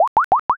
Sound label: bubble